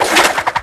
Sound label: skateboard; bucky